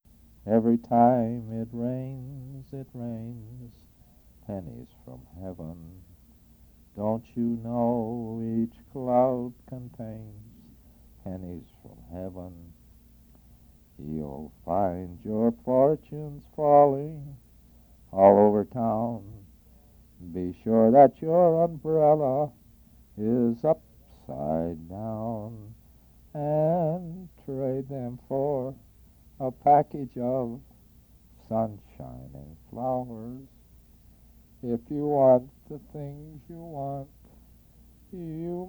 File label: singing